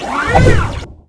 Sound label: ATTACK